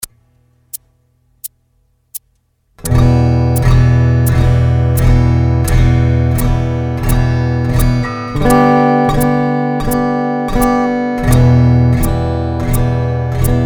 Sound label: Chord practice